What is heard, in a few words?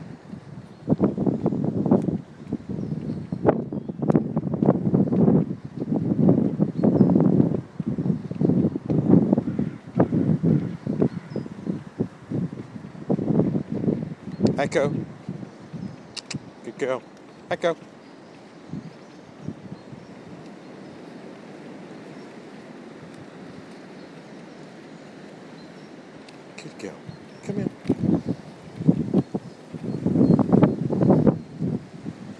walking echo in valley; bourne